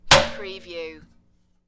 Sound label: Metal Short Impact Ringing